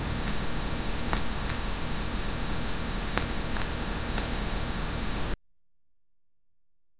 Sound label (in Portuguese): crie som de riser mega; sound design